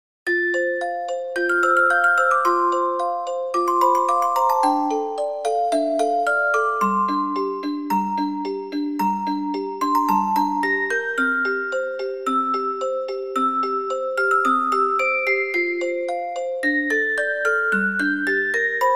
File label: spring; オルゴール チェレスタ ミュージックボックス; ベートーヴェン; スプリング・ソナタ